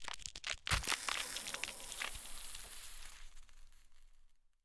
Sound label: ambient, dried ghast